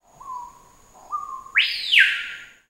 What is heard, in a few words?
Hawk Squeaks Whistle